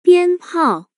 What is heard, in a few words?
bian pao; CNY